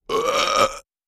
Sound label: Med Throaty Belch; belching